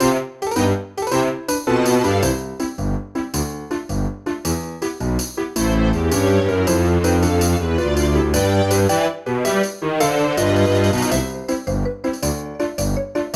backing & restored piano and perc notes

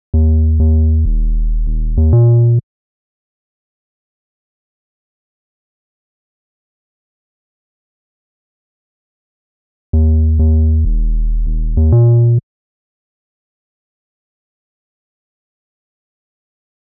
sub; peroxide peak

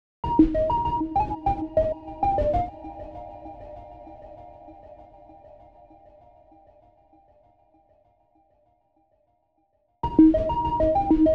serum patch; peroxide peak

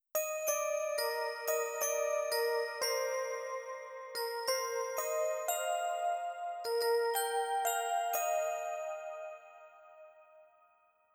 Ping

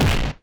punch; roblox

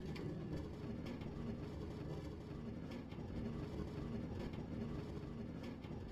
minecart

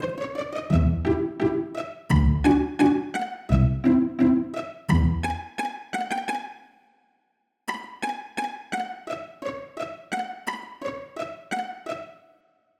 pizzicato

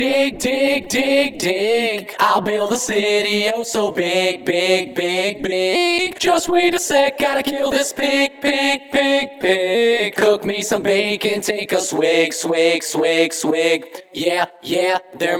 Vocals

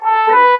brass

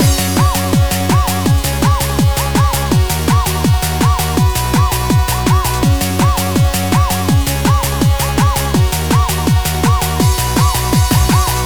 vocal off

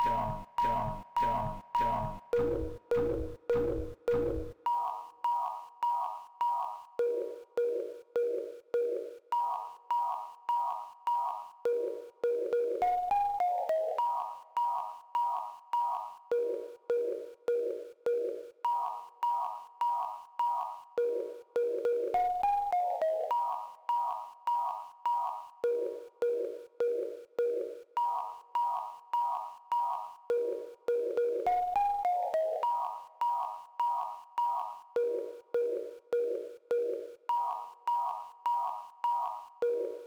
beeps

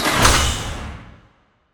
space hatch close

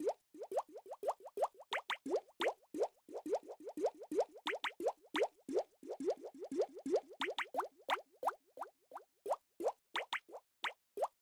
Noticing Motif

